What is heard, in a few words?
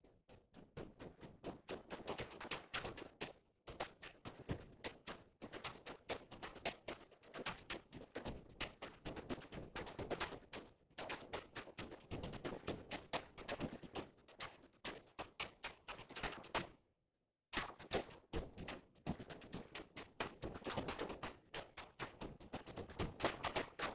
CONGAS Residual Noise